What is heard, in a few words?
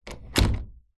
household door office close small room; zapsplat